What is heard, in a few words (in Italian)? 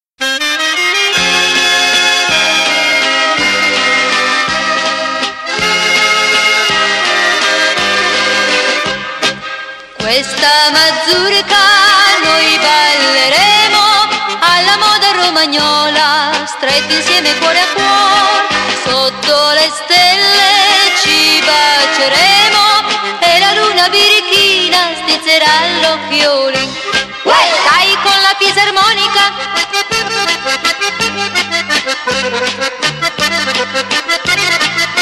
MAZURKA ROMAGNOLA; FOLK